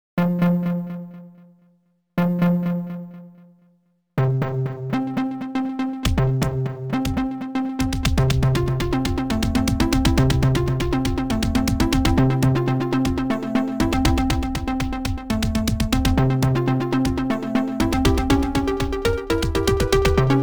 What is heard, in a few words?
mystery exploration